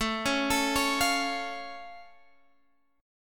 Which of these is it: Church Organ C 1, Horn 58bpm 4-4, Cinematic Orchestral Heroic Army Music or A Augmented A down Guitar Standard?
A Augmented A down Guitar Standard